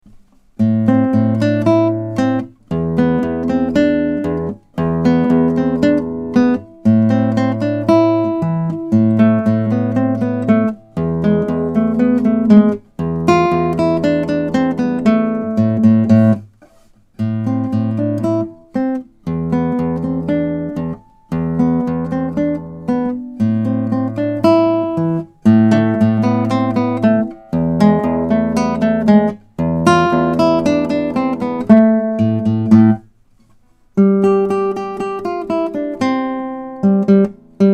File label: Tango De Salon